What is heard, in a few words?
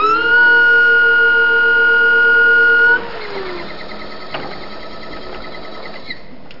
machine; blackhol